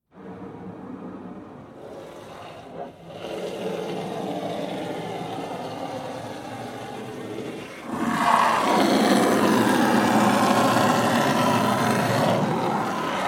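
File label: Water Spray Interior Car By Hard Long; Car Wash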